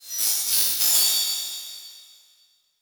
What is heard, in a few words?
Title Transition Crystal Shatter; Production Element Title Transition